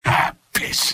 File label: sniper negative vocalization; tf; mvm